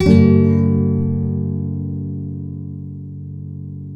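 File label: nylon up minor G#, guitars, flam chords; Spectrasonics; Hans Zimmer Guitars VOL-2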